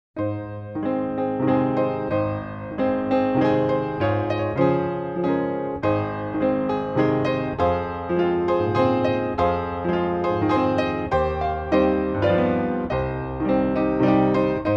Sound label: Waltz in A flat; Soundzabound Volume 2; Brahms